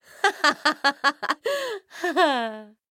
sniper laugh evil; Kinessa

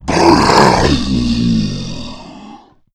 alert; agrunt

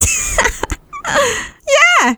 scout positive vocalization, femscout

